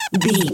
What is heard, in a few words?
voice fun creature squirrel